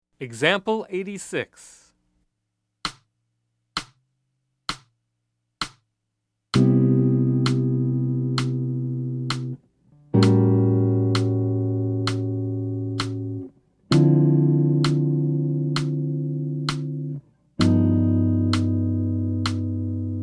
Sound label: Ballad; alfred publishing